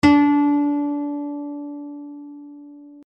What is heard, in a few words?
koto